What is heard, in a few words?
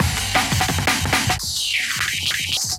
Washed Up FX, Loops, breaks; m8 breaks